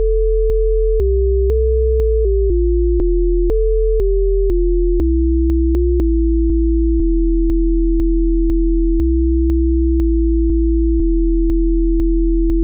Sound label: song